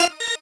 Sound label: normal hit normal